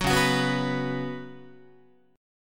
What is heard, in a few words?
Db 7th Suspended 4th Db down Guitar; JGuitar